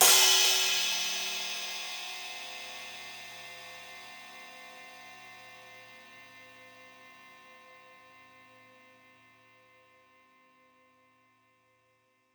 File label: crash; eddyflux; dough-samples